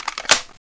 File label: m4a1 clip in